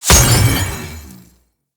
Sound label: Weave Boss Proj Impact; Cephalon Weave